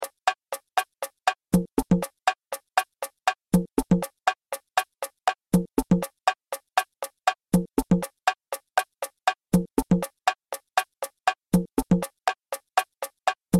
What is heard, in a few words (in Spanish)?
changui